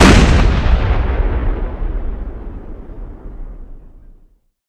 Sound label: explosion; civ13; civ14; Civ Weapons Explosives Explosions